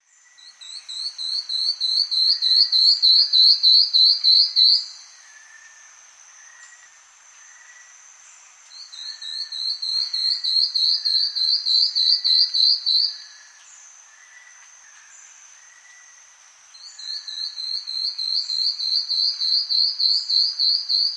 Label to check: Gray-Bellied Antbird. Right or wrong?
Right